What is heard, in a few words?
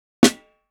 snare